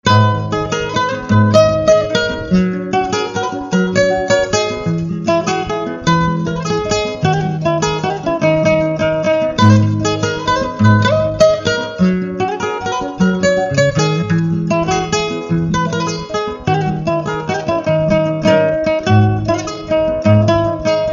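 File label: guitar new; alternative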